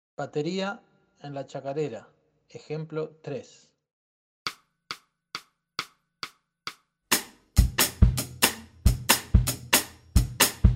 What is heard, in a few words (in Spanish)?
Bateria en la chacarera